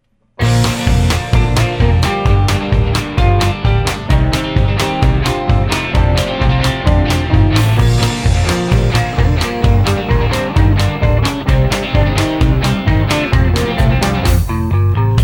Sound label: ringtone